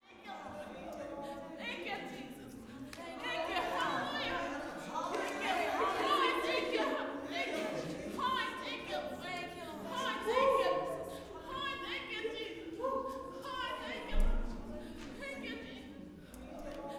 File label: WORSHIP, Gospel; Spectrasonics; Vocal Planet; WORSHIP AMB